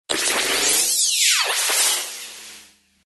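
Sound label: magical transformation sound; zvuki prevrasheniya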